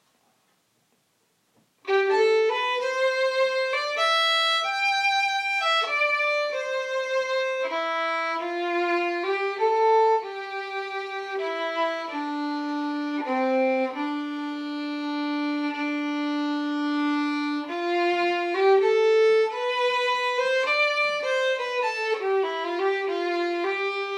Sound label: childrens waltz